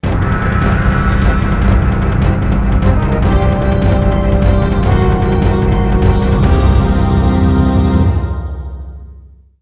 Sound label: music; mcdemo